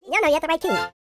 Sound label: YODEL YELL, World Voices; ILIO; Vocal Planet VOL-5